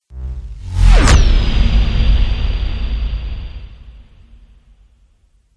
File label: spaceship fly by; george gazetis